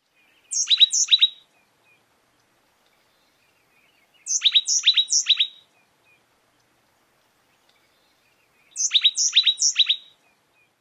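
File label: song, bird; CA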